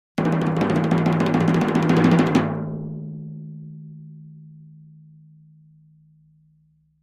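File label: Drums Toms B Roll Crescendo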